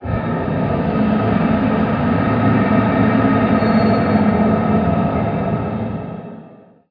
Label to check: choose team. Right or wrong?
Wrong